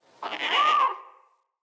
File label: Trapped Beaver Call; Encounters; Animal Rescue